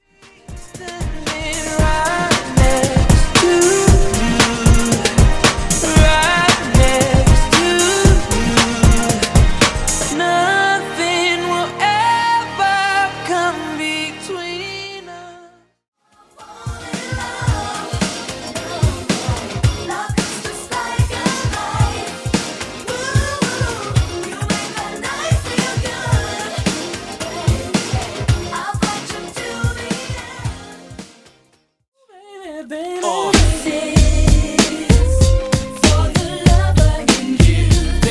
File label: RB Old School ReDrum Mixes 115-86BPM